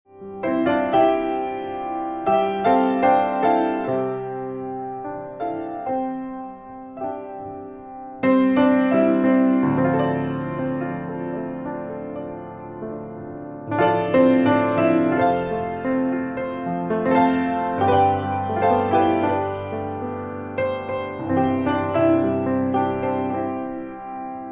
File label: Piano; معرفی میکروفون استودیویی و حرفه ای اس ای الکترونیکس X1 D; se electronics x1 d